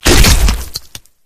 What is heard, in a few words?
bodyfall combat form; flood